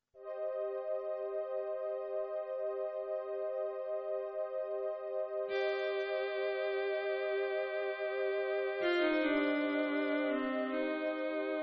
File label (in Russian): синтезатор, Танго; А.Пьяцолла